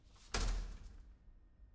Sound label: door slam; IEEE AASP